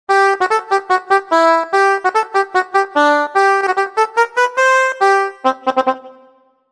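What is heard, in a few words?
Accordion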